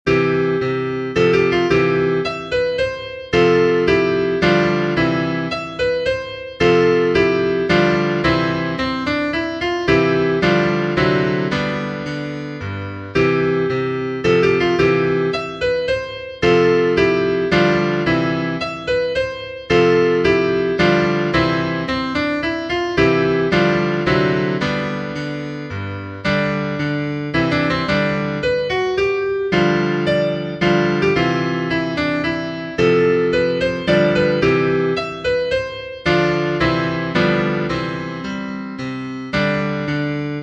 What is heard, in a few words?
Menuet in C Major; Bach, C. P. E.; Barocco